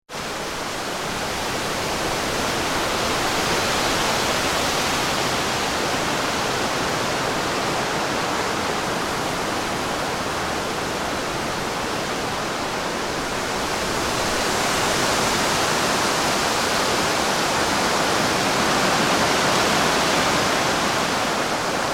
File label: Wind In Trees; Gentle sounds of water birds on a calm lake at dusk.; Weather